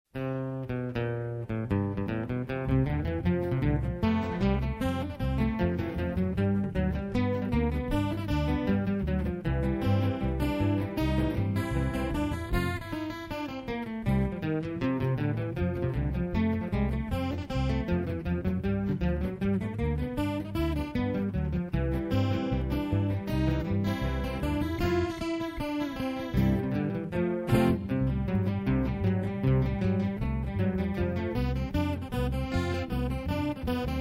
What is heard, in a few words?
country musette